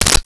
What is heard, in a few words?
Gadget, Mechanical